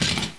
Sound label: metal, debris; XDM